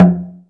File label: LOG DR L, LOG DRUM, Africa